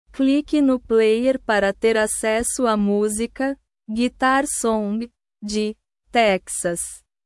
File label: guitar song